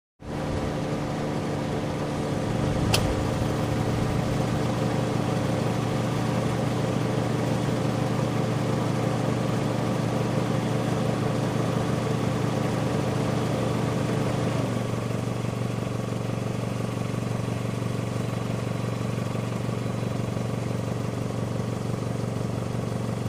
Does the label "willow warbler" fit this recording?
No